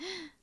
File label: Girl shock